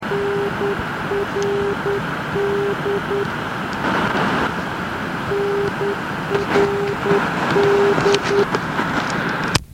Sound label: NRD 445KHZ received in Forest, NDB Beacons; Inowroclaw; nearby Wloclawek